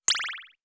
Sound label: Elec crystal